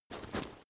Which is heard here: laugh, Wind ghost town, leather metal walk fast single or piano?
leather metal walk fast single